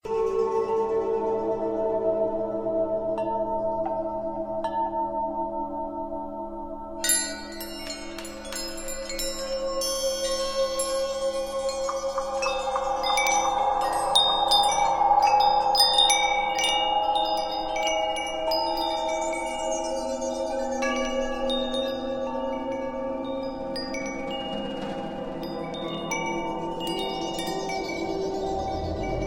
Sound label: Night Ambience; On Finn Ice; Angry Birds Seasons